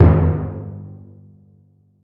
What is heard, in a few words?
low mid tom one shot f key; tom drum samples